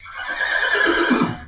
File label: horse dying; minecraft; technic